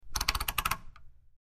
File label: Rotary Dial Turns, switch clicks